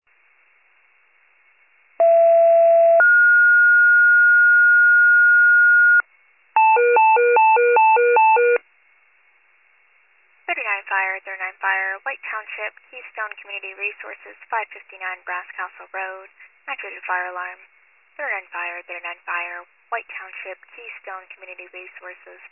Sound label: fire, pager alert